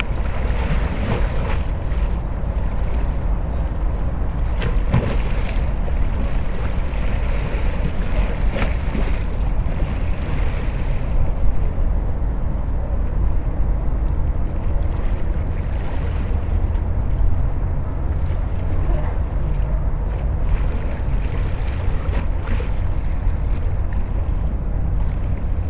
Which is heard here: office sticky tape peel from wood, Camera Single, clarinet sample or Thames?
Thames